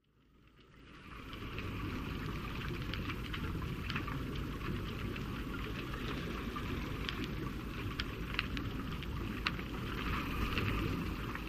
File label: Under Boat Cove Smal